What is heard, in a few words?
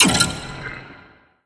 impact, physics, vault